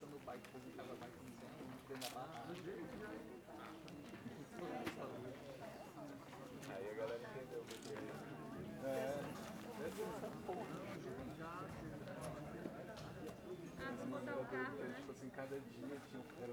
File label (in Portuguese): Posto de gasolina pessoas conversando e andando, porta malas batendo; multidao; Colecao Sonora do Cerrado